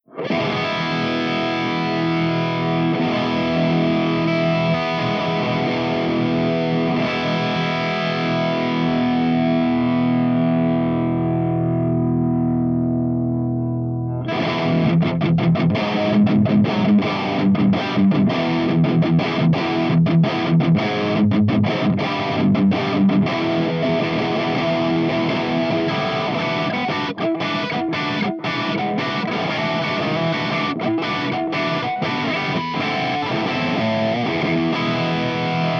BLACKSTARHT CH3USA V30 HB

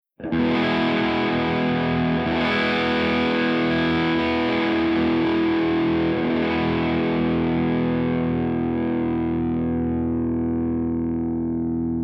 MARSHALL JTM60 CH2 DRIVE

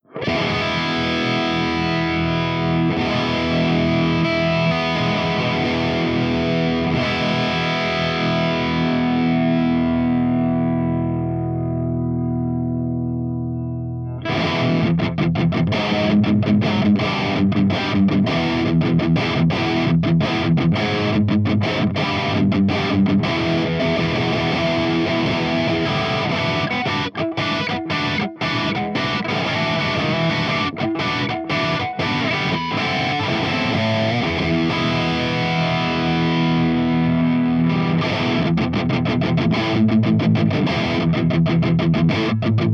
ENGL SOV CH4 LEAD V30 HB

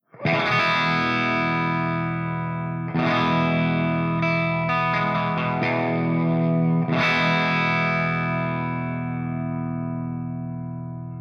MARSHALL JCM800 CH2 CRUNCH

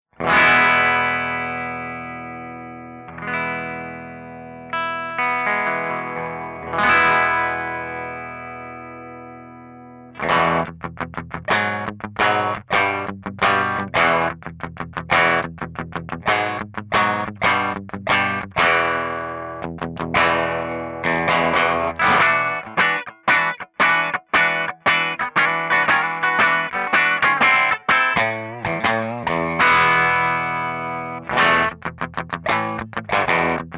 FENDER TWIN BRIGHT P90

